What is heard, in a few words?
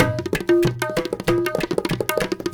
INDIA R, IND ENS PH, Heart of Asia